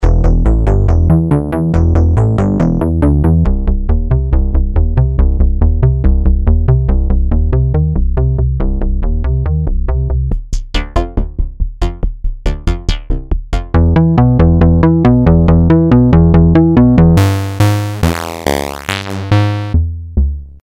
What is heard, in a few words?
Bass Sequences, 140 bpm